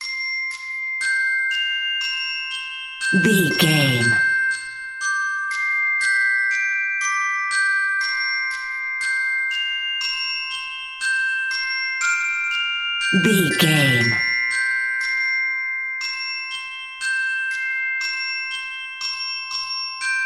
glockenspiel, A great piece of exciting and unique royalty free music; Bobbie Shafto Went To Sea Glock